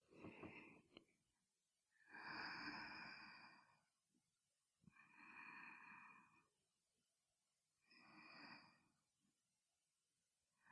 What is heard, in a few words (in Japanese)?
寝息